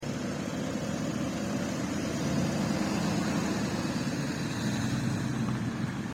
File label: bus leave